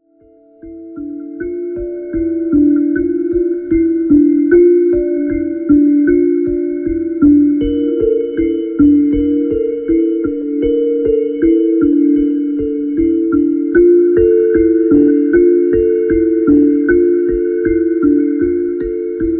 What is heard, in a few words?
Sounds of my Hands